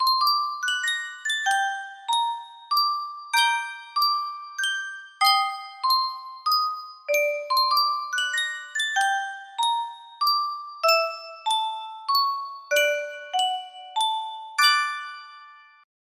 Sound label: yunsheng music box, g minor